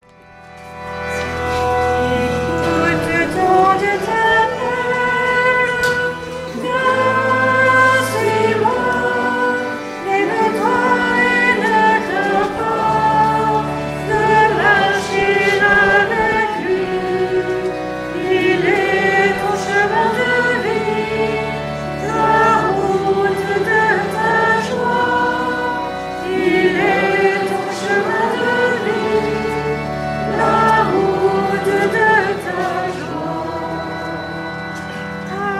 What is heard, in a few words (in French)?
chant entree